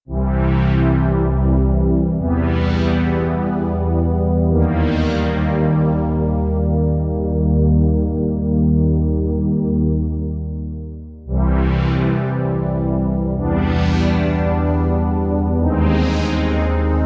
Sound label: Analog Motha, Korg Radias-R